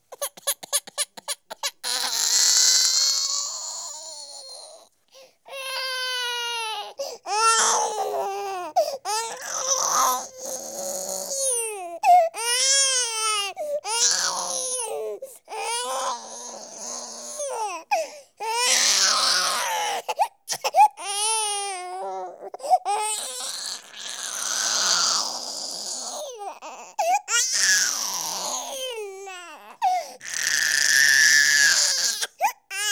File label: Week Old Boy, Hysterical Crying, Babies; BBC Sound Effects Library